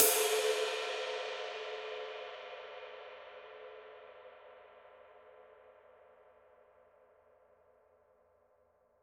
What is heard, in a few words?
PAISTE TWENTY MASTER DARK RIDE